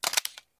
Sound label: MP5 mag in; Zomboid; VFE